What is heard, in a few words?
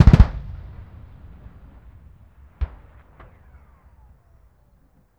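weapon anti-aircraft gun firing distant short; Explosions; Call of Duty Modern Warfare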